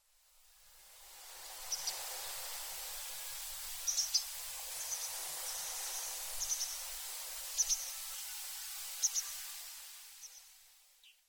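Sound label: hojirohaku, Pine Bunting; yonaguni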